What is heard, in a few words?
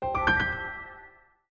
unlock swipe; Samsung Z3